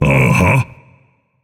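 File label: non-verbal agree; smite; atlas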